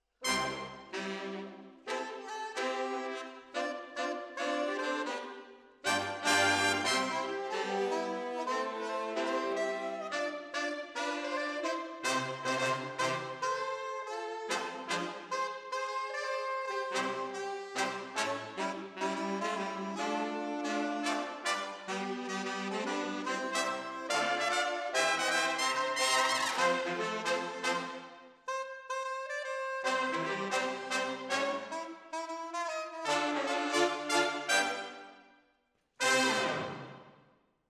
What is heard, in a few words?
Decca, Jazz for piano